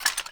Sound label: Foley Action Metallic Punch; Arm